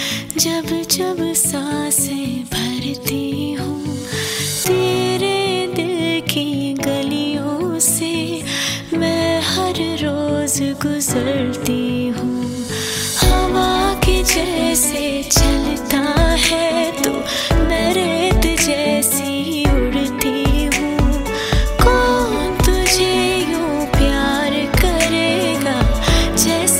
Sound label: ringtone bollywood ringtones